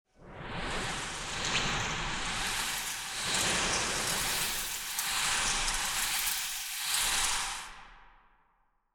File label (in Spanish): aleteo